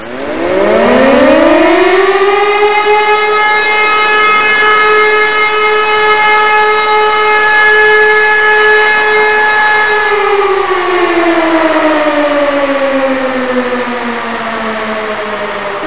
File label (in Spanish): sirena